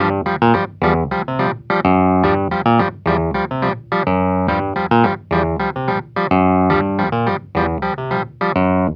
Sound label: 107 Gmin Clavinet